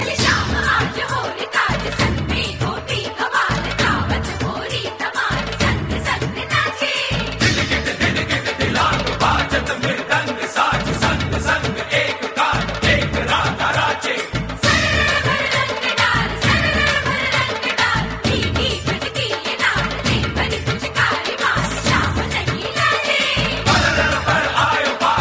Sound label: Happy Holi Female, Ringtones